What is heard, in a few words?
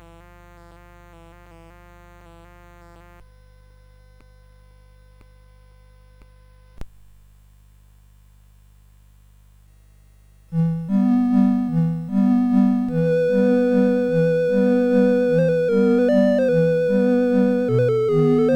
CSG8580; Stride la Vampa; CSG8580R5